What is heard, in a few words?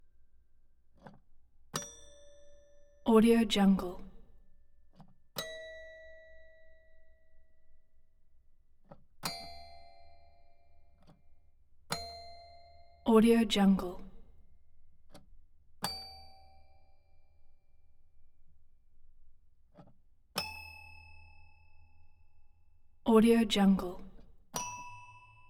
toy piano grand hitting each key